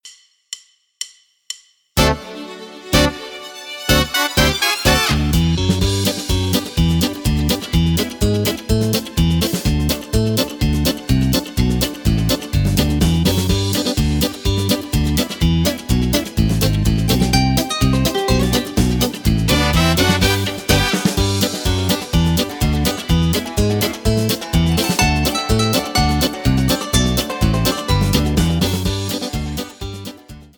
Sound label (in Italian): polka brillante